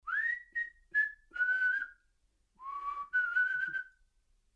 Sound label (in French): Test DeVerberate Siffler